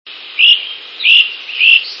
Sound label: flycatcher GC, Great-crested Flycatcher Myiarchus crinitis; Indian Head Point, Mohican Outdoor Center, Delaware Water Gap; burry call sound